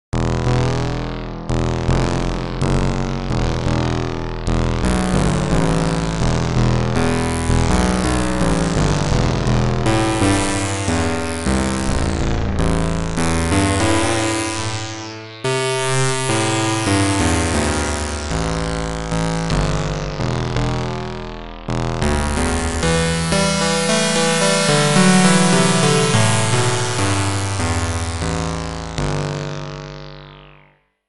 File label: The Moog Polymoog